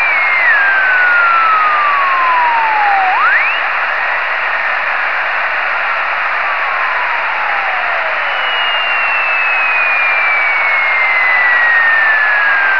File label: sidecarriers